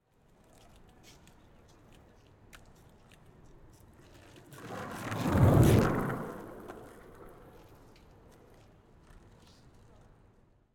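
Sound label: Skateboard, Pass By, Left, Right, Close, Perspective; Rzpost; Skate on Board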